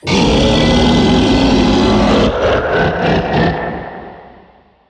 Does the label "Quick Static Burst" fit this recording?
No